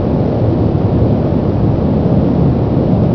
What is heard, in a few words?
jet